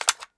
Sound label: sg553 clip out, weapons; csgo